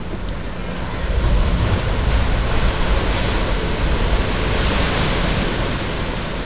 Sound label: ocean waves